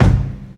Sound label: prominent bass drum c key